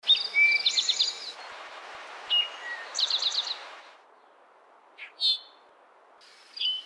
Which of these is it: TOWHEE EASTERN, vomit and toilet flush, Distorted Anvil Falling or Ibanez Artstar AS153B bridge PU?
TOWHEE EASTERN